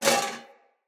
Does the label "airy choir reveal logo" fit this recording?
No